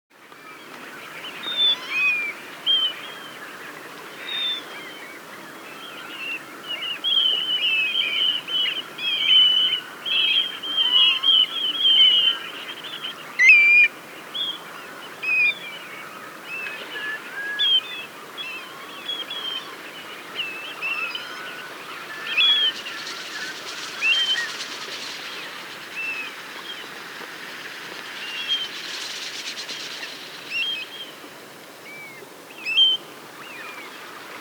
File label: Golden Plover